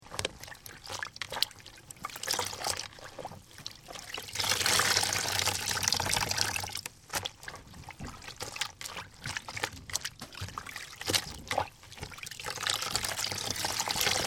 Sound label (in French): lessive